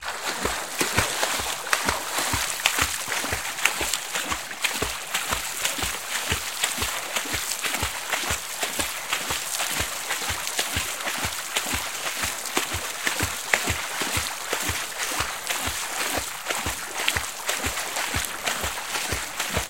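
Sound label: sounds of a person's footsteps in water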